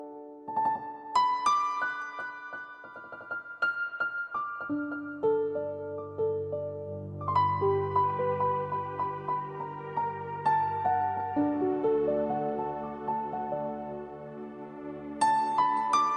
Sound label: Startup Tune